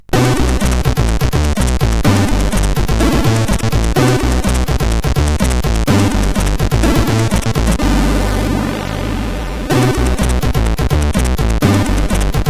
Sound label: Get Ready Jingle, CSG8580R5